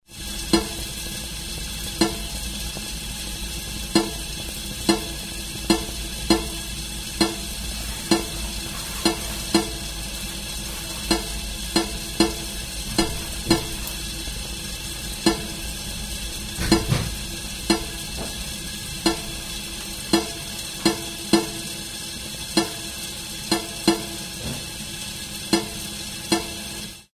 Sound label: kettle; fieldrecs